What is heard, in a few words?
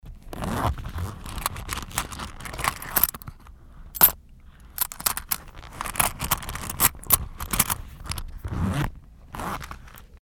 purse